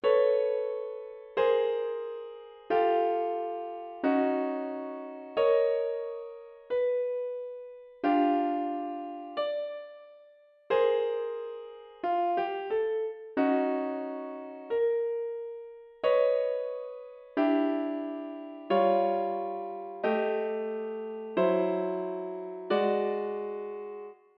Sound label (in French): Batterie